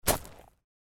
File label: footsteps gravel